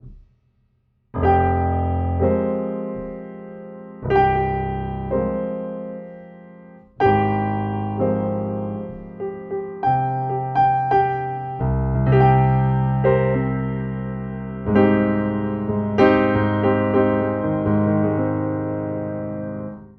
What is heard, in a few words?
Piano loop tape Studer 800 Tape Recorder; UAD